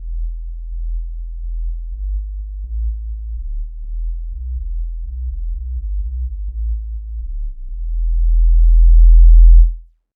whistle bass

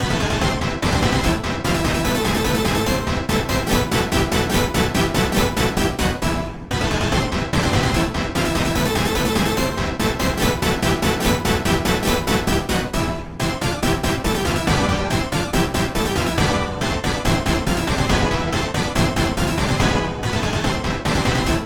orchestra hit

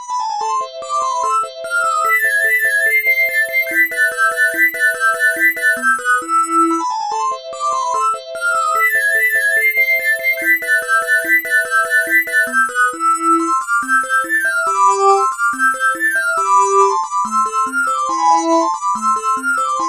metal pad

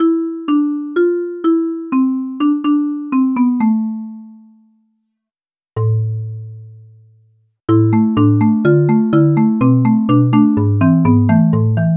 marimba

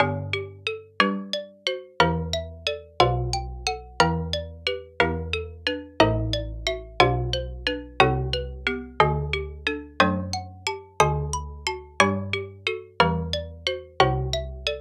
xylophone

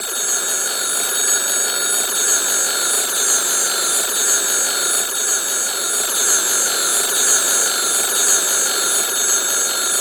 telephone

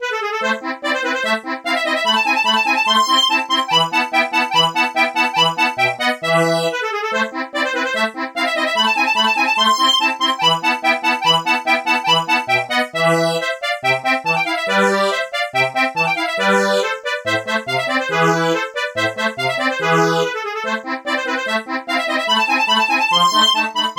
accordian mozart sf2

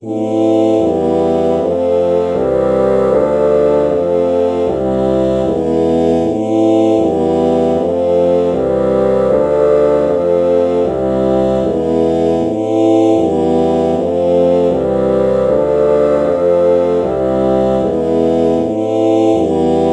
oh bassoon chord morph

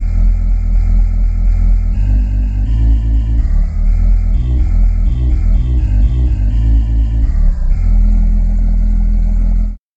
ohh voices bass

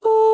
ah oh melody morph

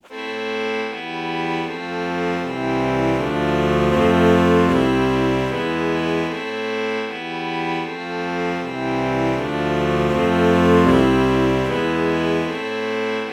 alto saxophone violin chord morph

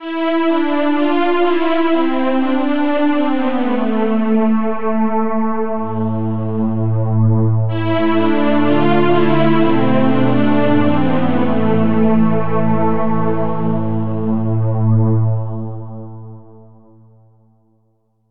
sweep pad

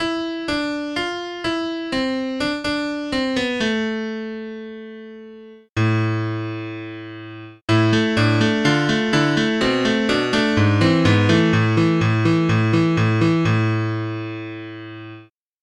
bright yamaha grand; SpectMorph Evaluation #4

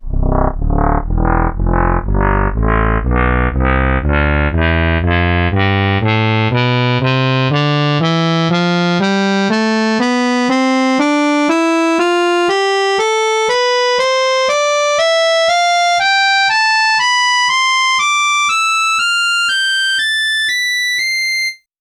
muted trumpet tonleiter

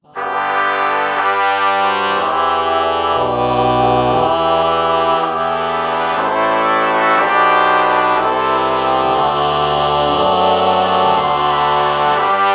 ah trumpet morph

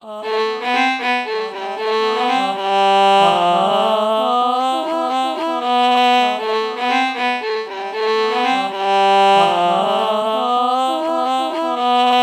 ah violin melody morph low

